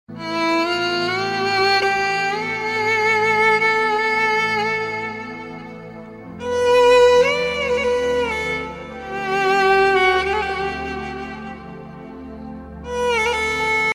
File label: instrumental ringtone